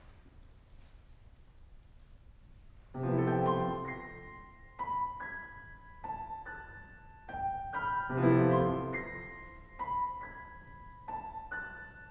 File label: Concerto for Saxophone Quartet